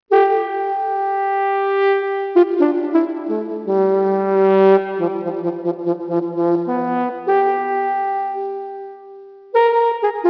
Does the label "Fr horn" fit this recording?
Yes